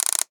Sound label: mechanical gear switch